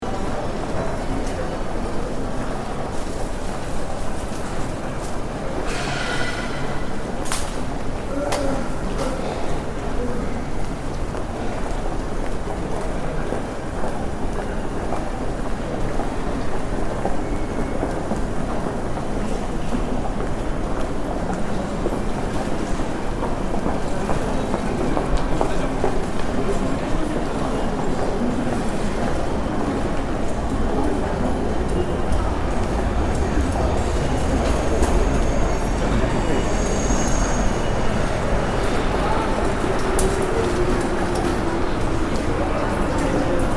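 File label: subway gate entrance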